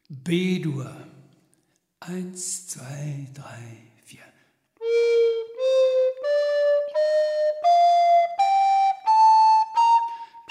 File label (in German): Altfloeten tonleitern